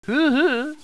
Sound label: startle, hostage reactions